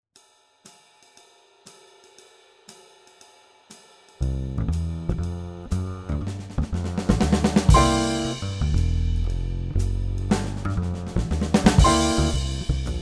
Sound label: Swing only 2bars, Drum Fills; Essential Drum Fills; Erskine